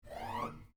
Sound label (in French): poussière